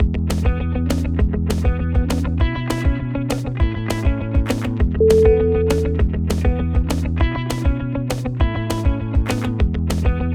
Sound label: corporate up beat